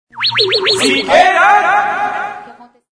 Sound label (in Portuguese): vinheta; Sikêra